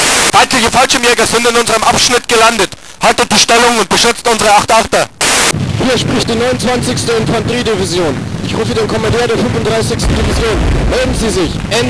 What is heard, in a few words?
radio